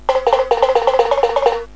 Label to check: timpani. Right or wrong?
Right